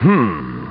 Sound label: humm; BattleSNDS